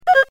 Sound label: Toon